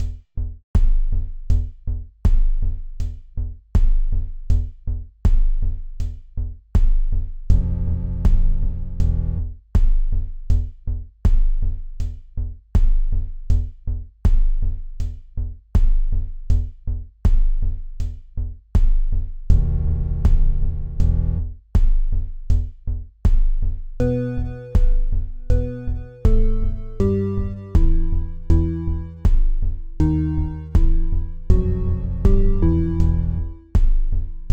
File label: tension slow